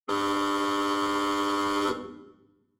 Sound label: Opening buzzer